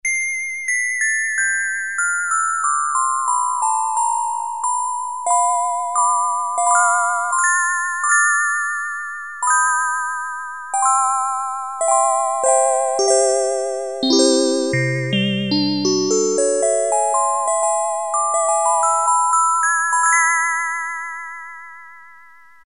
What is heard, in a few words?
SYNTH GLOCKEN, Casio CZ-1000